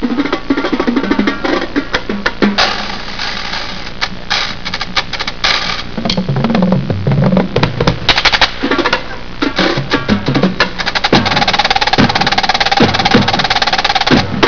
Day Danse- This clip is where they are leading up to the famous, spotless roll.